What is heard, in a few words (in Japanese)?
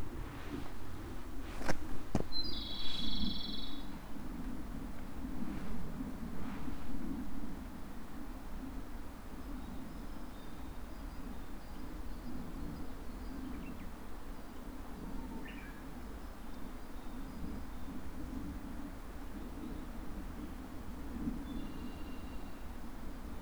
komadori; takane jyatouge